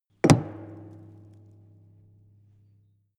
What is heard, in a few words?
metal impact tank solid